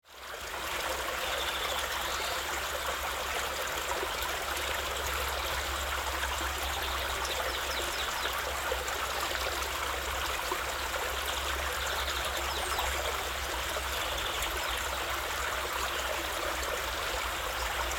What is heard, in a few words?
singing of birds